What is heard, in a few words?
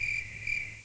cricket; combat